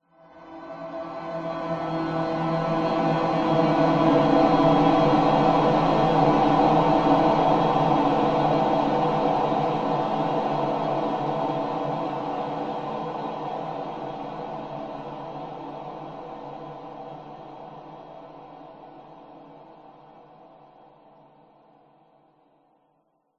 atmospheric dark sinister swell ghostly airy vocal ahh